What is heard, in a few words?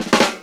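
drum roll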